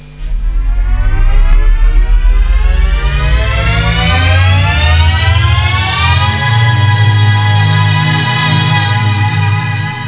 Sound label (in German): Aufzug